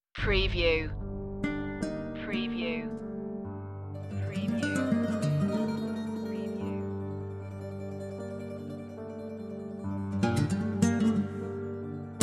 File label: no violins